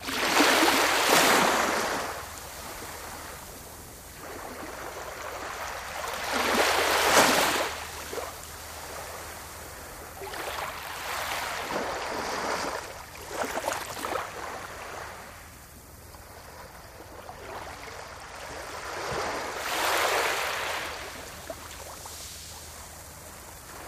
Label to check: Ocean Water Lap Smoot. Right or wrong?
Right